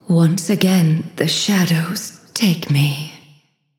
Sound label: death; smite; pele